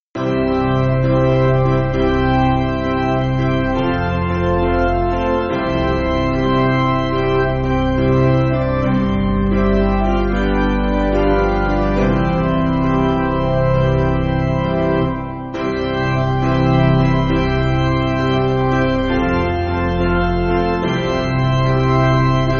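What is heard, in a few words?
Piano, Music Backing; Glory Day; O Holy Night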